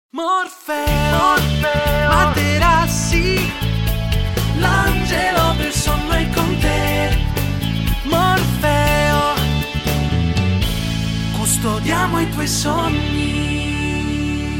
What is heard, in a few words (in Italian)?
jingle